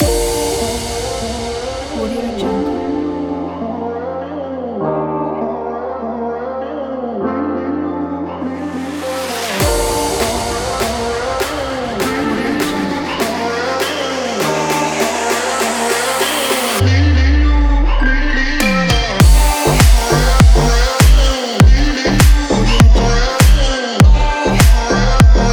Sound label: deep house